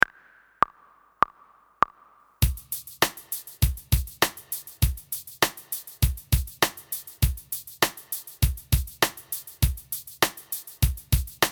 Da Practice with Slide Ge Tu and Na Without Tabla

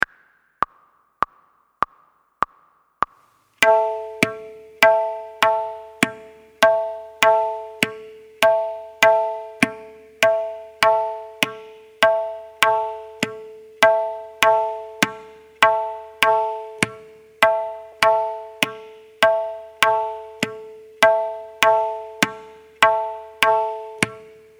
Daadra tal Practice

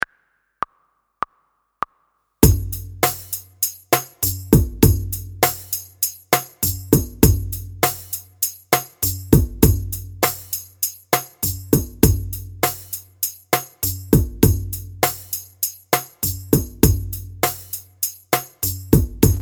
slide practice without tabla

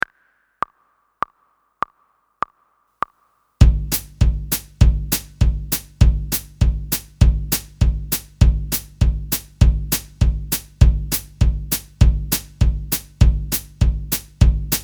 Ta Practice Without Tabla

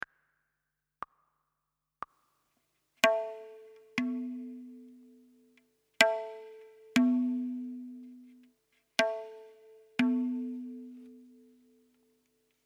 Tabla Solo

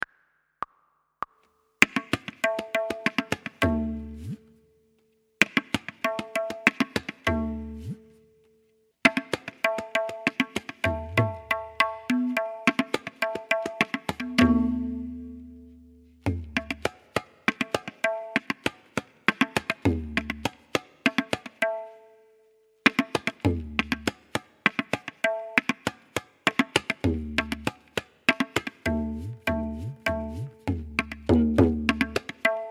Tabla Solo

